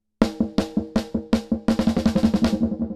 DIGITAL MODE NOISE; Zen Delay

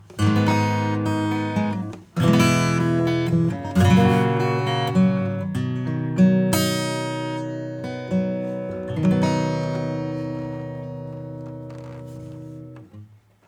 Acoustic bypass

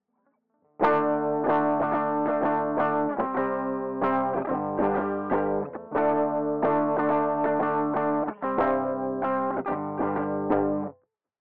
Warm fusion drive; MTurboAmp

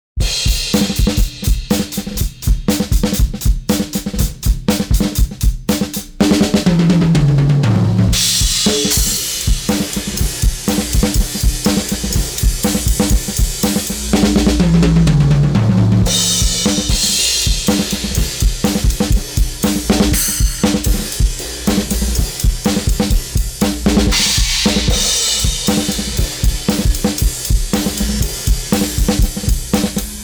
drums Hit 60s

